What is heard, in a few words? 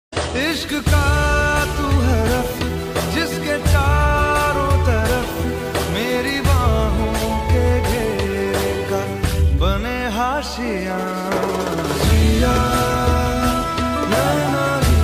song ringtone instrumental ringtone